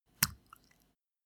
step barefoot wet

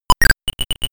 incoming communication

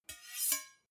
two knives large scrape short

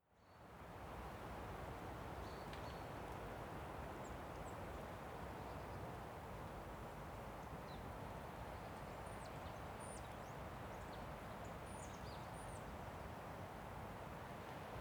birds quiet wind

